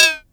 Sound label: SYNCUSSION